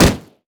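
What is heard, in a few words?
turret basic attack; LoL; heimerdinger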